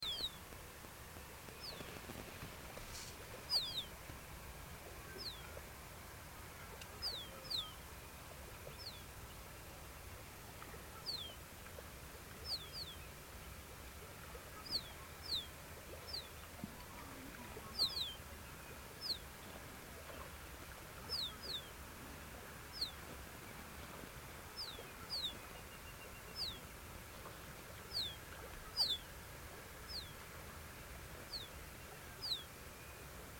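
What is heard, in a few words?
musk duck biziura lobata; lopastnaya utka; utok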